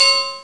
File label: bong